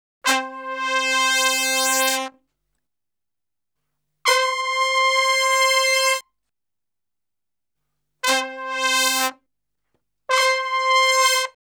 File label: Salsa Picante; Masterbits; World Zone VOL-3